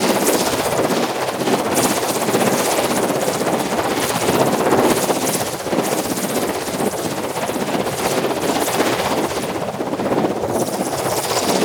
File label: Axe Hover Loop; Warframe; Weapons; Tenno; Sundial